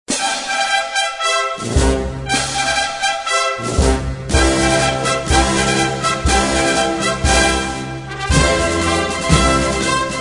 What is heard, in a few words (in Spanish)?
Semana Santa marcha